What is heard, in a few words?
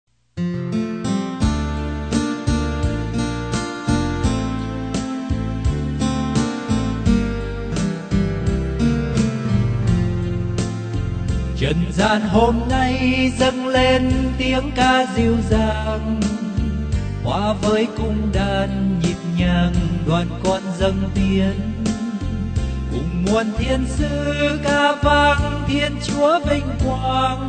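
soprano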